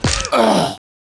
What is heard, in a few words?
fall damage death